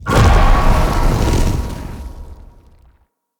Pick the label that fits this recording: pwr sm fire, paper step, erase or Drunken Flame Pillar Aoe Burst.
Drunken Flame Pillar Aoe Burst